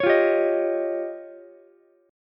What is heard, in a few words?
F 7th Suspended 2nd Sharp 5th, Ukulele; F7sus2#5; strummed; JGuitar